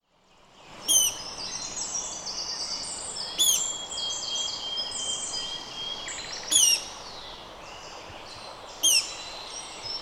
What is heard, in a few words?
Water Rail